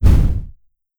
explosion; NIIIEMAND's explosion sfx

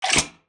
creature slime; RPG

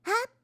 jump, Catgirl Fighting SFX

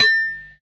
Bell; MMRetroArcadeSoundsPack

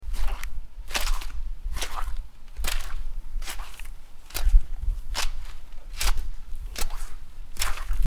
Mud Footsteps; horror; horror sfx vol 2

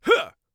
lightattack, Warrior Voice; Warrior Voice Pack

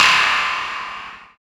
Buzz Step; FREE SFX PACK VOL 2

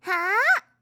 swing, fighting

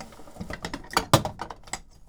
Drawer Move, toolbox rummaging

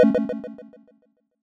Retro Event UI

